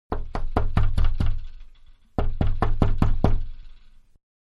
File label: Knocking On Wood Door, Inside; Miscellaneous Doors (Sound Effects:Doors); Manhattan Production Music